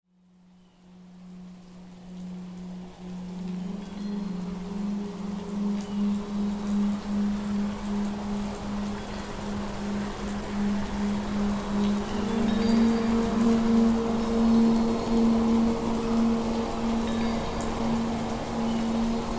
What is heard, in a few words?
Stormy; Upgraded Stormy Escape Spiritual Ascension Audios AstralHQ